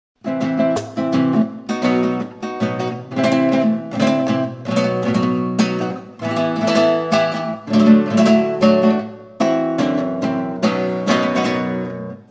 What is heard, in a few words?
tangos